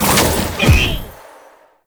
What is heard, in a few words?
Reroot Return C; Warframe; Nokko